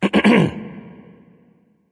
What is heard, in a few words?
clear throat; smite; hebo